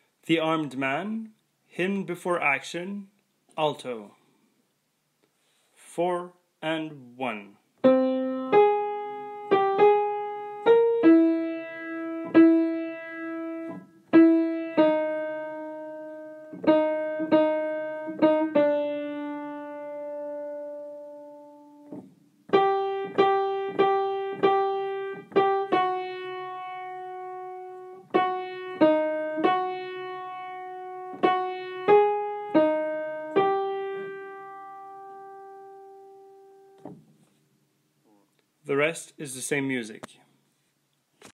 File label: Alto Piano